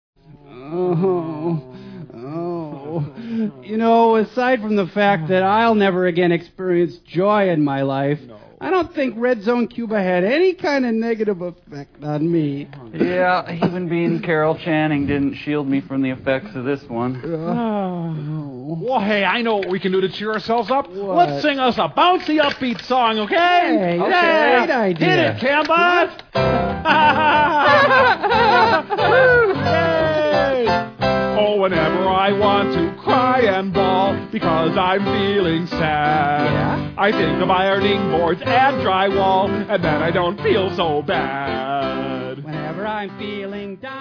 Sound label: Bouncy Upbeat Song; Mystery Science Theater 3000